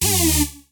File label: Hoover Short